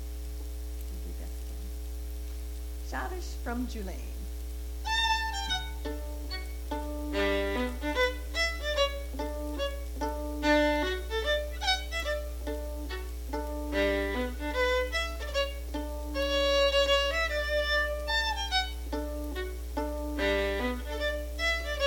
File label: Plucking Schottis